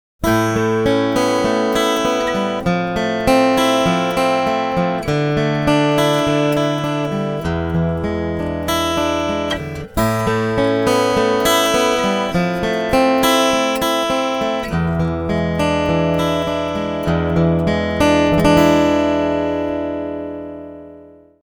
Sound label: open chord picking